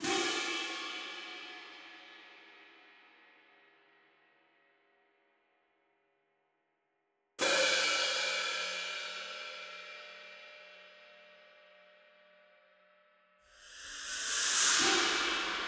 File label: Loop Cymb